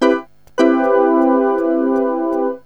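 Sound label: major 7th, synth chime; cubase; CUBASIS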